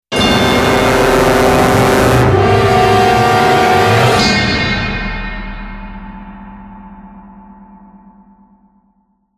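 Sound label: Sting Large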